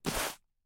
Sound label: Cloth Drop; Game rip; MiSide